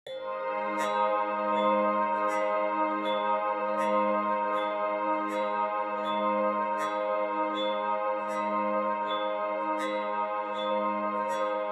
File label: ambient